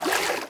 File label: swim step; Golden Crayon Sounds